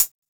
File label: Hat